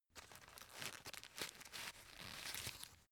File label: tissue stuff in package